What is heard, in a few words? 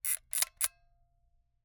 Camera, Aimex SP500, point n shoot, plastic, wind film, ping; Eiravaein Works; Vaeyan IV